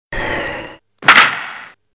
Baseball Hit

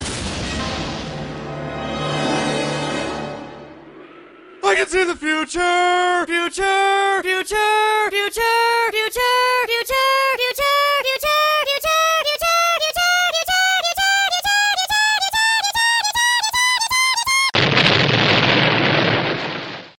Future Alien Dies

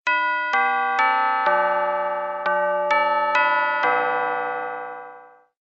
Door Bell

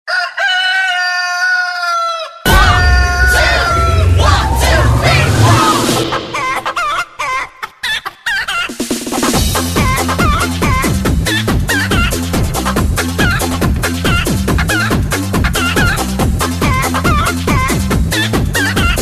Chickens Concert

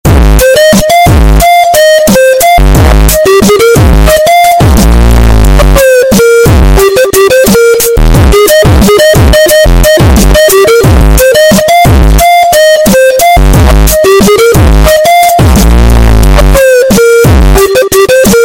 Bass Drop

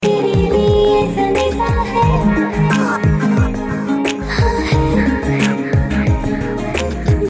Sweet Hindi Sms

